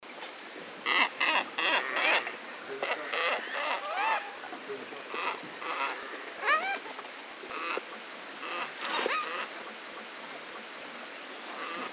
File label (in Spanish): ibis cara oscu; cantos